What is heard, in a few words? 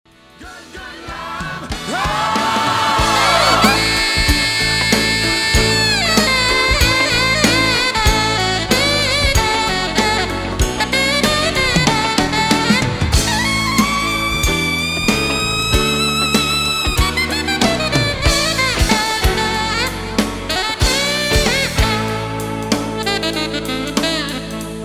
Alto, sax